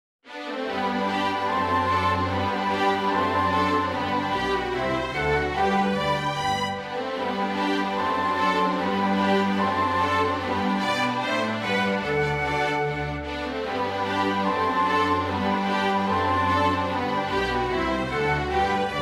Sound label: allegro gavotte, ballet pantomime; ninette a la cour